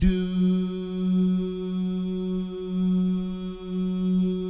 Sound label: DOO GUYS, Vocal Jazz FX; Spectrasonics; Vocal Planet; JAZZ COMBO